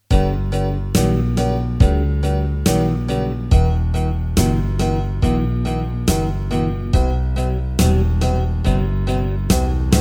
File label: Sad tunes melody